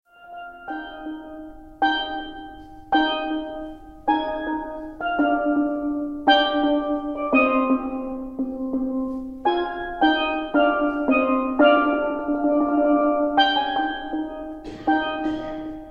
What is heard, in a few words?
Suite for Solo Lead Pan